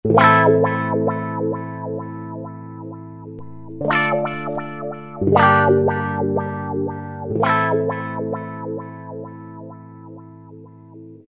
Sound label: Waw Chord; Roland SRX-05 Supreme Dance